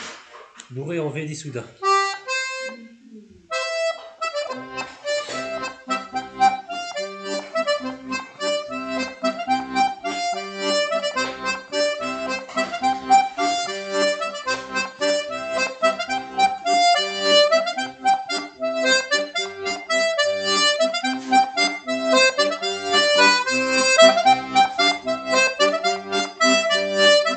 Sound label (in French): bourree